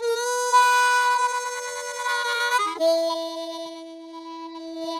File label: harp; Verdigris Music